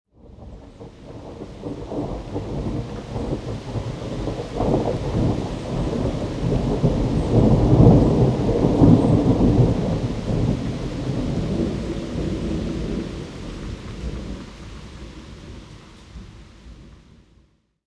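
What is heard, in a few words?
thunder distant; Call of Duty Modern Warfare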